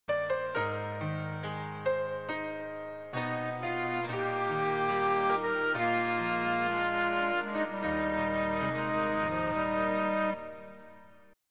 Orchestral

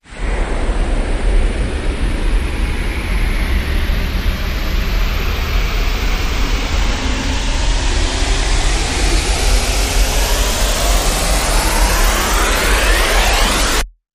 Rise Short; Peak

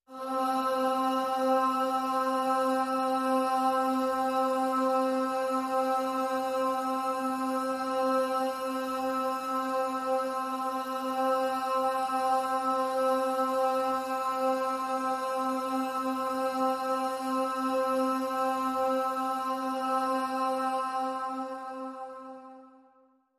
Pop Vocal C

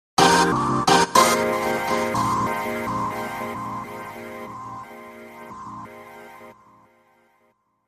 Cool Synth Logo

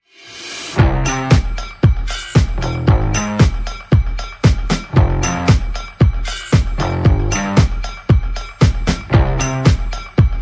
Percussion and Bass

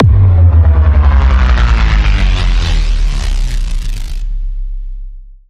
Downer

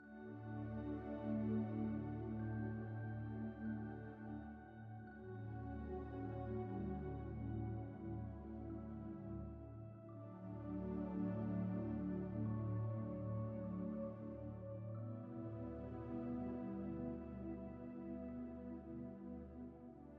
A Beautiful Day, Pads Synth 2

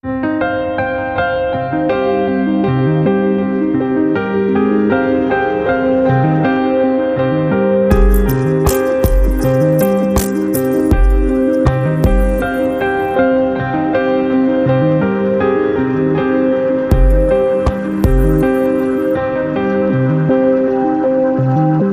Pentatonic Mandala

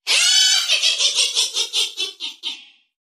Creepy Clown Laugh; Say Cheese

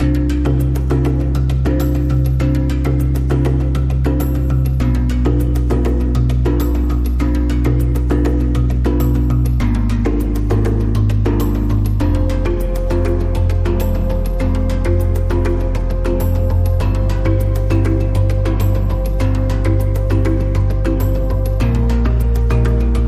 Hand Drum, Underscore

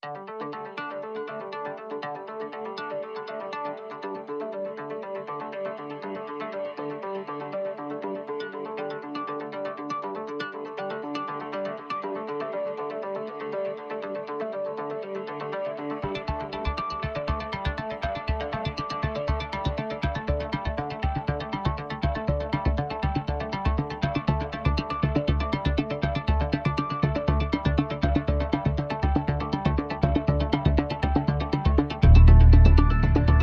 Pads and Pulses Only

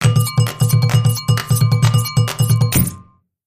Drum Circle, Sting

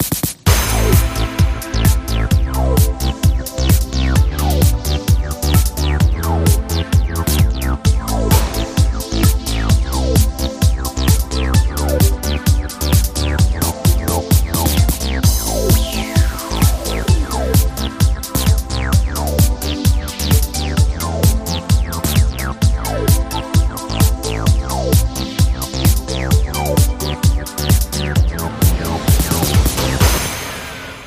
Chain The Bass

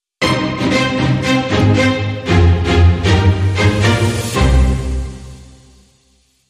Sting No Drums

